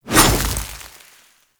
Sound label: Hover Whizzby Angry; Warframe; Pax Duviricus